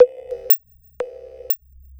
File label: Structured Rhythm, IDM Rhythms